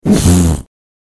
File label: wind fat fart; sandy sfx